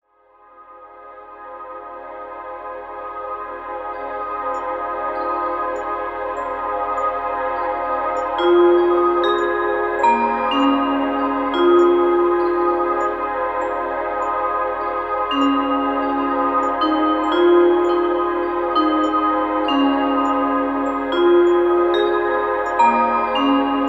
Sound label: cave